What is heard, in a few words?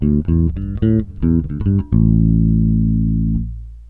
FREE 111 A, Bass Grooves; USB Soundscan vol.30; AKAI